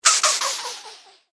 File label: Cartoon Pumping Sound; Cartoon Sound Design Sound Effects